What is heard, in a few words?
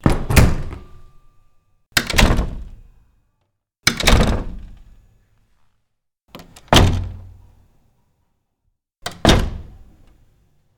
Door Closing